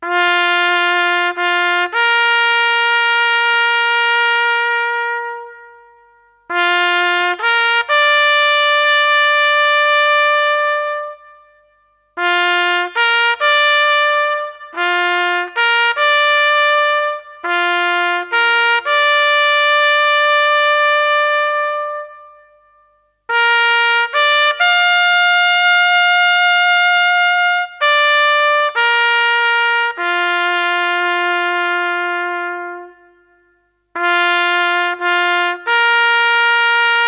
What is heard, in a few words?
Taps